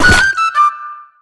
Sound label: Easter Four Leaved Clover Destroyed; fowl; Easter Blocks